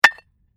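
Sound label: Brick Impact Or Cinder Drop; Stone slab, brick or cynder drop to the ground and settle; Rock Sound Effects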